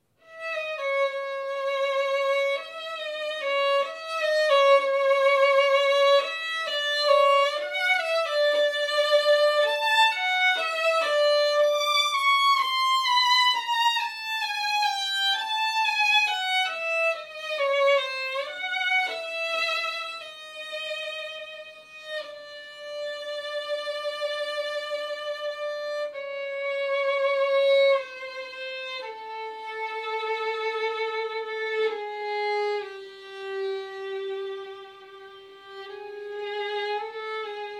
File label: Violin; Dvorak